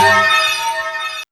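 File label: synth riff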